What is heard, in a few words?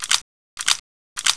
generic shot reload